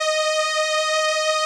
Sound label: SOLINA, String Machines